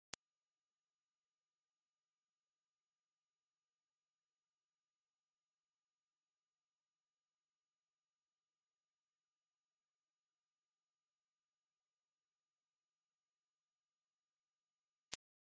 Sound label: Hi 15kHz -3db